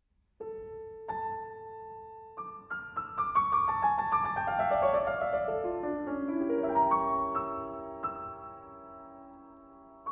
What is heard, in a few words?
Trio in D Minor, Finale. Allegromoderato